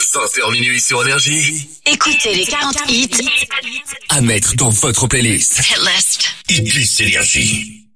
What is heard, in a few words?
Acap; NRJ